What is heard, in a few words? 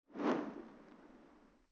rollover tree